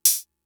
Open Hat, Drum Machines MFB-522; Fricke

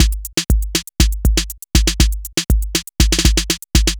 Synth Loop, BPM120

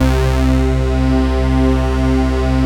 JUNO 106, PADS; Masterbits; Vintage Voltage

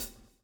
swing

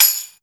TAMBRIN, TAMBOURIN; Big Beat Samples & More; AKAI

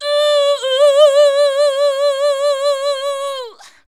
UUH-UUUUUH, SHOUTS; Best Service; Hyper Dance

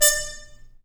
BRS, Harmon Stabs; Roland L-CD702; Harmon Amb

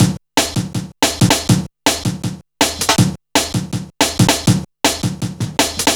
Legal, Jungle Warfare Drum Loops; Jungle Warfare 1&2

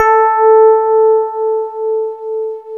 FM Rhodes; Club-50 - Foundations Roland

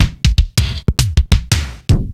Akai XR-10 Bassdrums

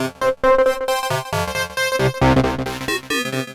Kaossilator gated (130BPM); musicradar

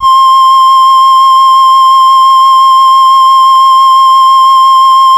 Casio VL1 Flute; Solo-Synths; Giga Samples Collection

